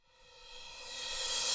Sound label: Cymbals Reverse Crash; Vengeance Samples